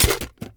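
foley assault rifle akilo47 drum reload empty fast mag out; weapons; viper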